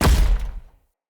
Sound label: Step Default, Footsteps; Enemy; Nimrod